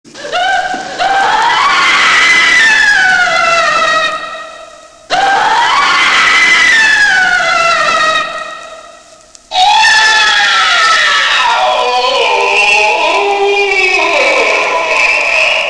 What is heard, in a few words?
Bloodcurdling scream